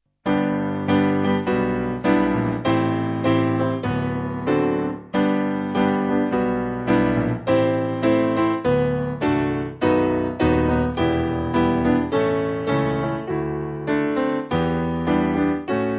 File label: Piano